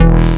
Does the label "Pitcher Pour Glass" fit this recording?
No